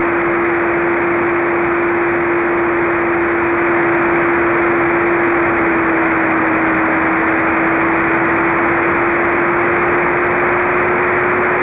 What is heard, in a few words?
beacon; AO-10 衛星